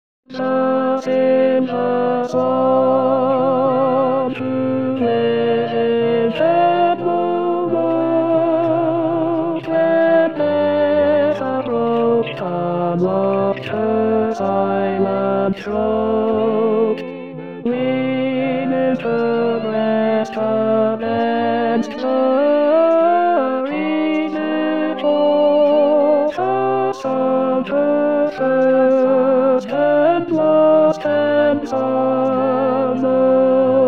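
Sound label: Silver Swan Tenor 2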